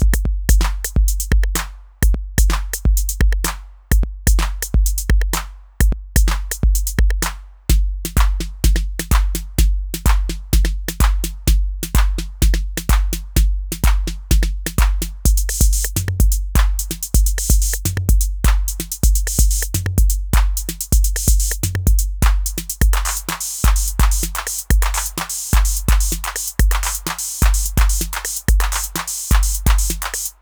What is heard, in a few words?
dj 505 loops